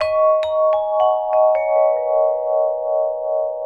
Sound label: VIBES; Houseworx